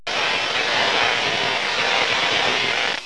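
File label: Tesla coil arcs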